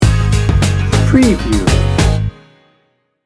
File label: hip hop bounce sting bumper